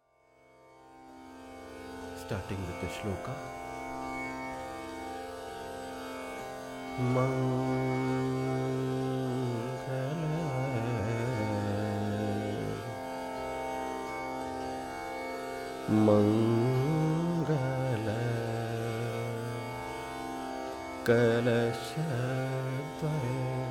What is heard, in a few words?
Raga Malkauns (Shloka), Dhrupad, Indian male vocal